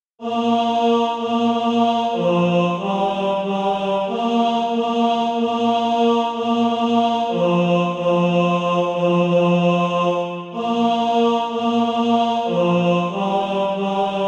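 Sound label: tenor, SATB Practise Music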